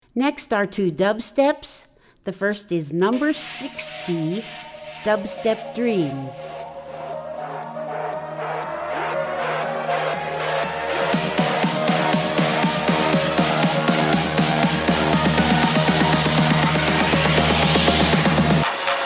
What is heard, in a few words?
Dubstep